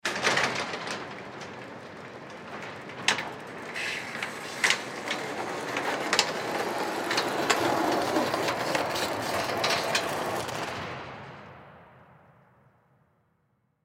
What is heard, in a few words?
Door Garage Go Kart Door Close; Garage Doors